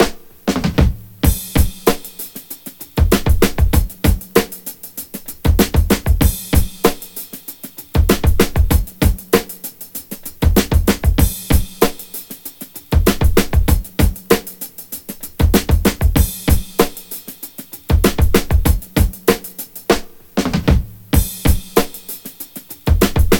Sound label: 96.5 Hardsoft, Breaks; Lockdown Breaks